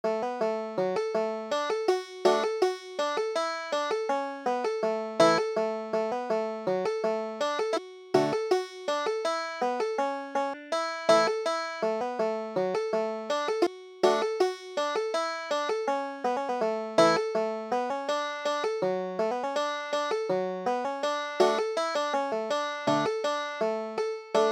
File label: banjo